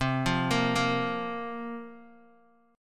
C 7th Suspended 4th, Guitar, DADGAD

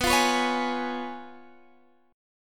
Db 7th Cb; Db7/Cb; strummed

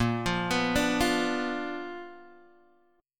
B Minor Major 7th Flat 5th, chord

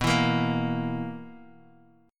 B Major 7th Suspended 4th, B, down, Guitar Open D

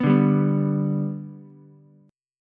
G Major G down Ukulele; G strummed; JGuitar

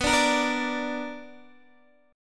B Minor Major 7th, Guitar, DADGAD

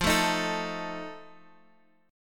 F 7th F down Guitar Half Step Down

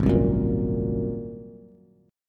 Ab 9th Ab down Bass; Ab9 strummed; JGuitar

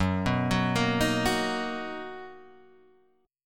B Major 7th F sharp, chord

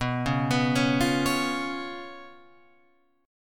B Suspended 2nd Flat 5th, Guitar, Open F; Bsus2b5 arpeggiated; 11,8,11,8,8,8; JGuitar

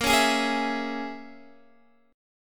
Bb Minor 7th Flat 5th, Guitar, DADGAD; x,13,11,13,11,14

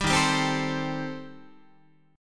D sharp 6th Flat 5th, D sharp, down, Guitar; strummed; JGuitar

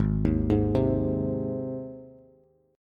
G sharp Major G sharp Bass, chord; arpeggiated; JGuitar

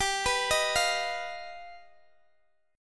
G Augmented 7th, Guitar Open D

